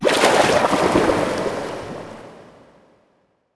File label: water skill; Water Baridon; Seer; GamigoNA